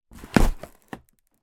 foley cardboard box pierce puncture with foot or hand